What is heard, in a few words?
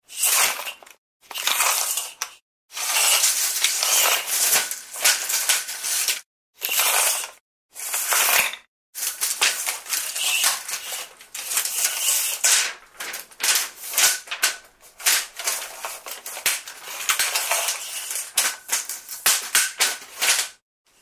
Tape Measure, Various sounds from a metal extending tape measure.; Machinery; Machines; Sound Effects:Machines